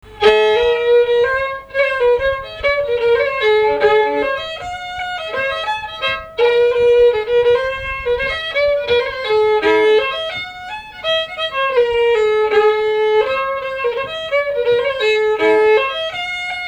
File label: march a dur